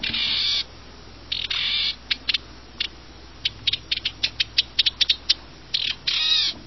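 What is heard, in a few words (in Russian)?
стрекотание маленькой птички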